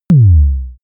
sub kick short

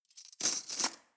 cutlery teaspoons drawer place soft

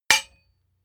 hammer hit metal dull

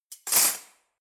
cutlery knives drawer place hard

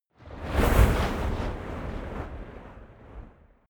waves surge

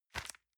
envelope grab medium

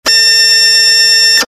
servo action style

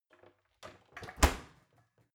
oven close baking sheet ringing soft

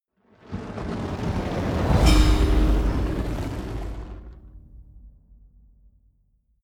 whoosh magic fire bowl

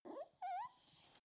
dog whimper attention seeking

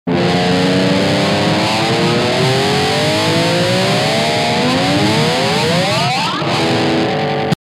guitar cut

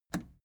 plastic button scratch smooth release enable

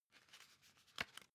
magazine handle

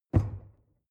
drawer narrow close lock

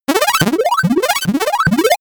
lasergun

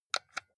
console controller xbox xyab press

